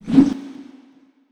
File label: chain skip; weapons; dota; pudge